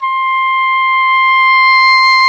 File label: oboe dry; Roland L-CDX-03 Disk 1; Oboe 4-8